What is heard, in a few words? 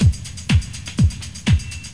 loop